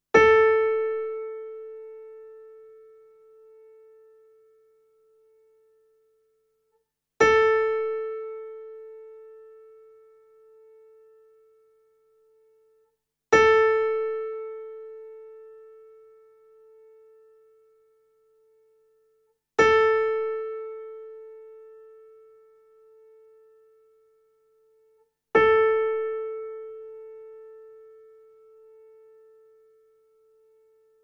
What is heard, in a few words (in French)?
accord